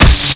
hit bod; weapons

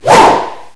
attack; schall; garg

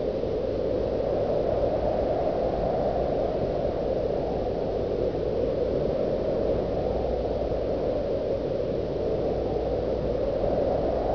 wind; svencoop; hunger; thambs

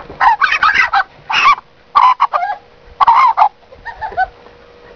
chicken die; svencoop; hunger

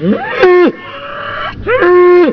bull alert; svencoop; hunger

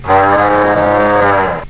bull die; svencoop; hunger

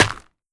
ric gravel

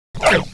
pit drone attack spike; svencoop

